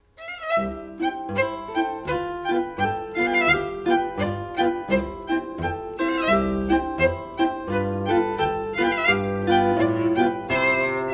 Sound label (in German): zither; Dreher; (live); Violine, 2 Zithern